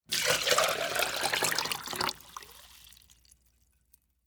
Water Pour Plastic, From, Plastic, Container, Into, Metal, Flower, Pot; Pouring Sound Effects; BlastWave